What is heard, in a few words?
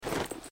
equipment close